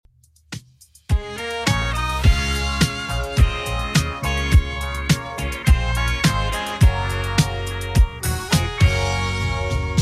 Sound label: 70s Redrum 105bpm